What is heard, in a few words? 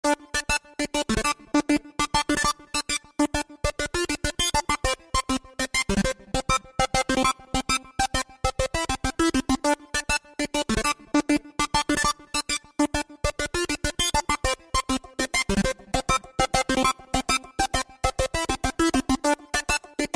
Reggaeton